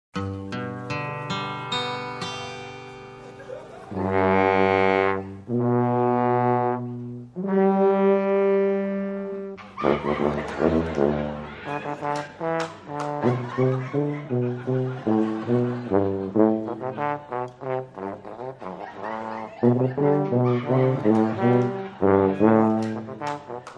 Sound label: tubas